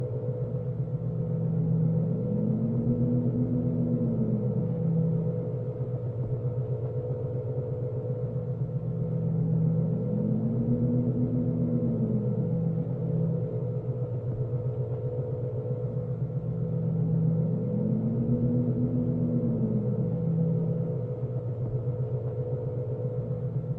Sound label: Medical Bay Ambient Sound; Alien; Nostromo